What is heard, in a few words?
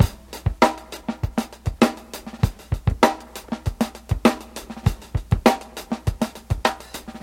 bebop boogie, Drum loops, Funky